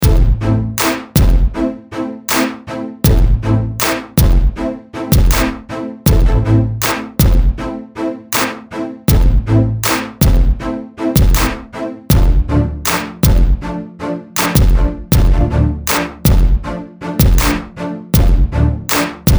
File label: groove